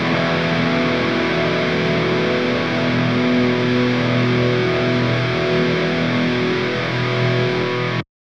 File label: Guitar Fudge Setup